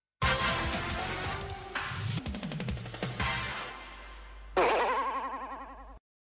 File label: tone; yyh